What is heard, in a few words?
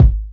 90s hip hop kick sound g sharp key